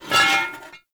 Pickup Metal; Warframe; Cephalon Weave

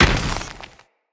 Moa Combined Step Dirt, Footsteps

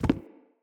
Walk Wood, Footsteps; Characters; Enemy; Mockeries; Mockery

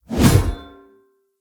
Great Sword Whoosh Air; Weapons; Player; Swords; Dendrit Ara Great Sword

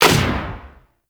Shot, Weapons; Warframe; Tenno; Protea; Velox Prime; SMG

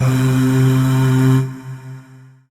Void Shawzin Oct One C; Warframe; Zariman

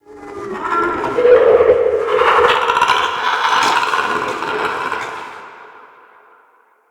medium creature chatter; Ambience; Under City

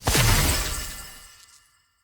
Team Buff Burst; Enemy; Sinecure; Melee Grunt Dual Agent; Abilities

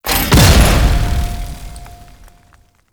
Heavy Stomp Impact; Abilities; Warframe; Tau; Dax Heavy

